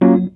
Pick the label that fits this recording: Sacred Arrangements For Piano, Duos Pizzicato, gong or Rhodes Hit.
Rhodes Hit